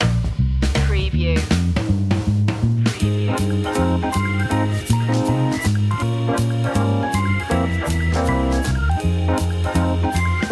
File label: jazzy mood stinger; jazz blues